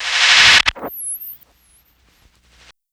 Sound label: Dub Noise, SFX, Electronic; Sonic Foundry (Sony Creative Software); Electronic Point Blank